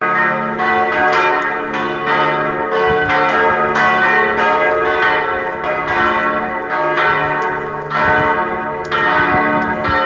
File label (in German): Petruskirche Glocken